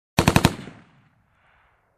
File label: Machine gun firing a few bullets